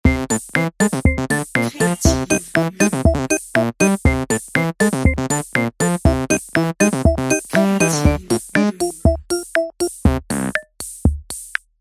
synthé rigolo

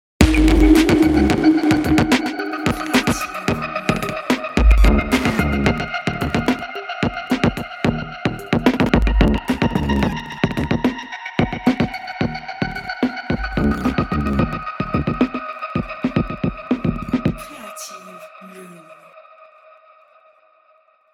Epic Electro Storm court pack musique pour bande annonce

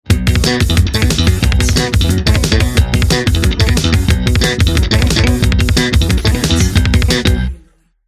slap basse

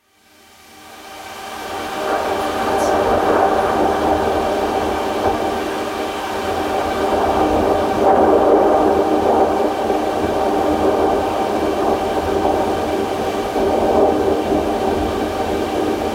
nappe sound design

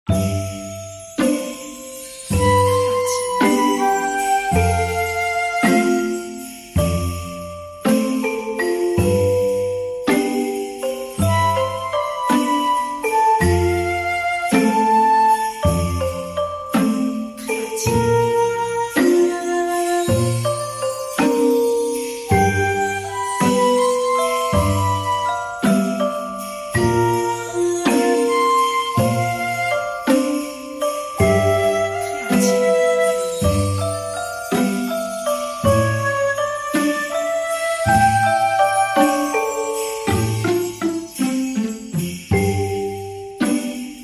clochettes de noël flute

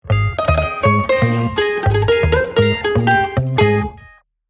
ukulélé

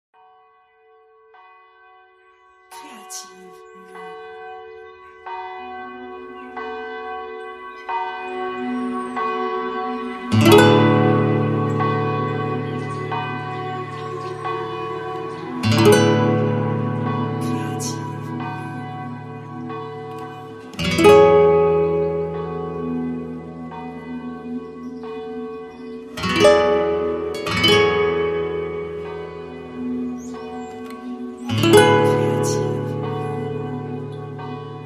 guitare espagnole

